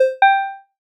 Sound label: connection restored